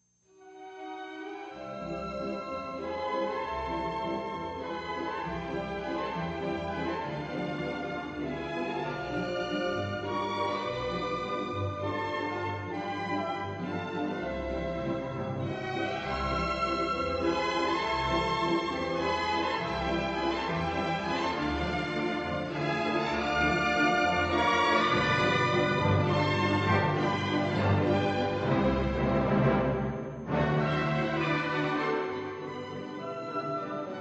song

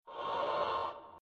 strike launch

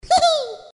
heehee

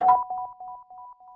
PowerUp; hyperspace shooter

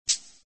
game over

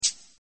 lifeloss

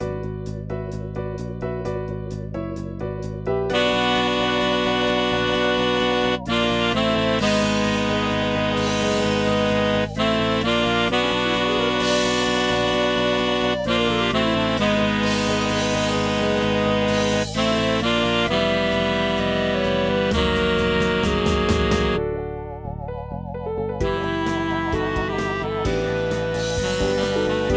BossBattle

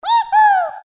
eeehoo

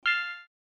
match

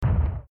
boom

